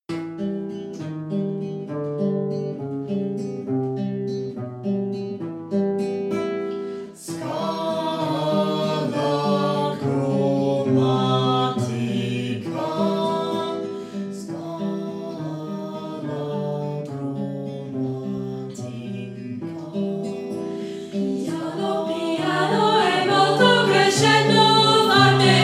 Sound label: audio tutti; dynamix